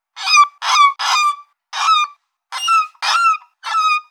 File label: misc seagulls; soundscrate